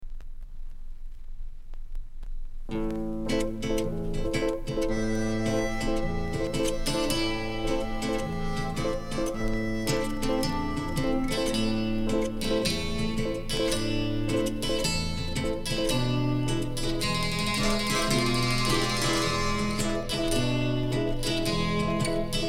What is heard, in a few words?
happy walking mood